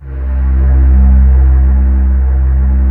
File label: pan pad C2; PANY PAD